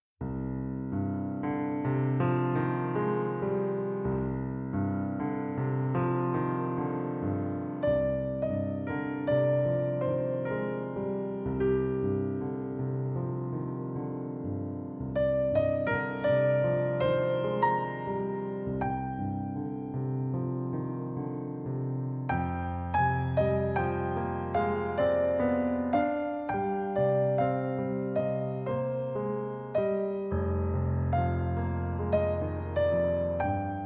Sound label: Nocturne C minor